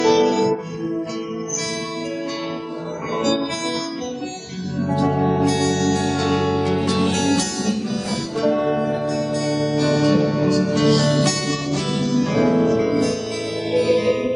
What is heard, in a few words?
free accompaniment